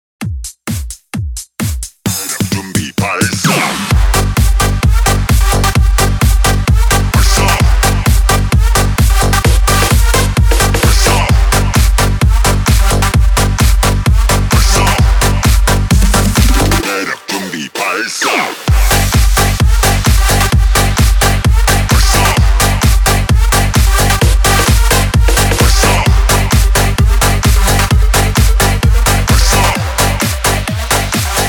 Turbotronic 130 BPM

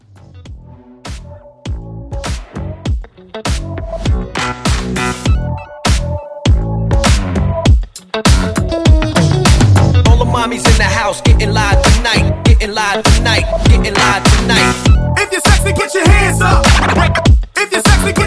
Hype Scratch Intro

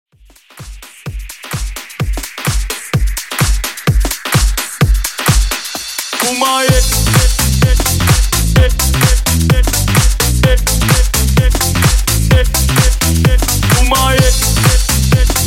Electronic Dance 128Bpm

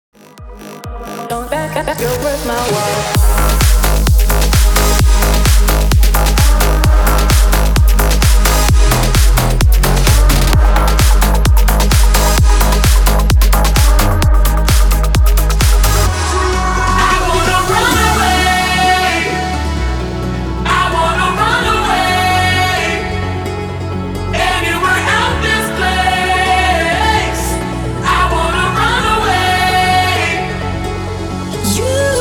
MASHUP 130 BPM

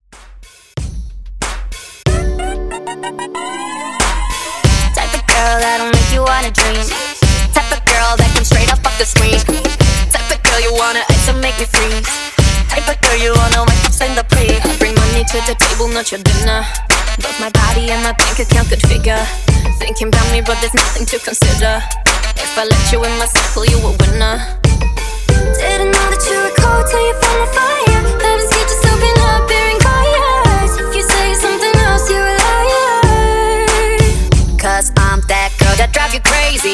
ReDrum In Outro 93 BPM

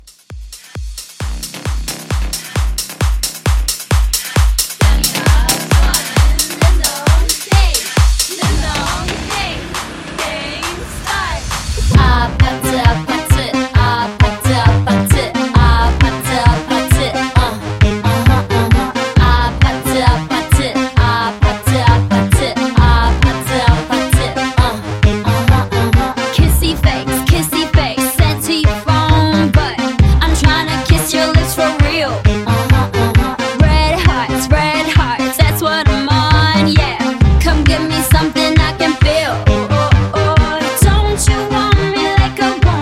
House Mashup 133

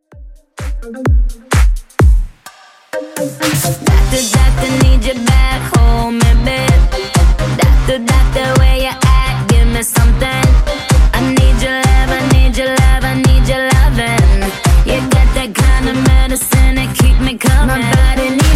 Top40 DJ Friendly Redrum 128bpm

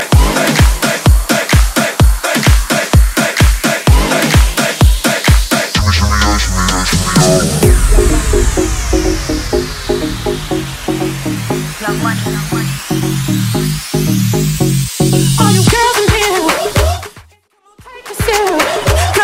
Trapsition 128 103

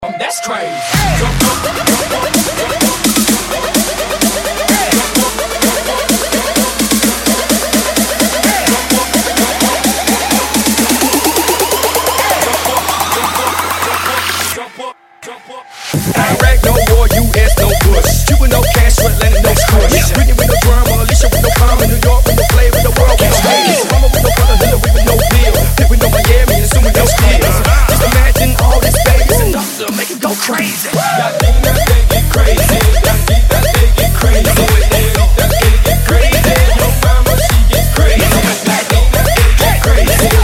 Funk Bootleg Mash Up 128Bpm

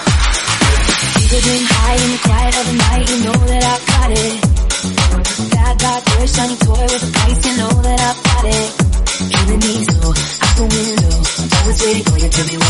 110 bpm

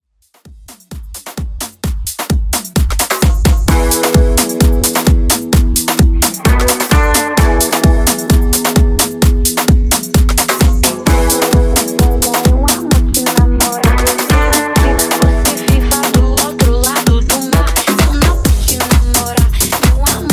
Afro House 130Bpm

